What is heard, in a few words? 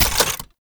Long Gun Charge Stop; Warframe; Thano Tech Arch Long Gun